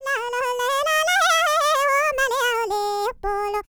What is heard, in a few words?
BALKAN, World Voices; ILIO; Vocal Planet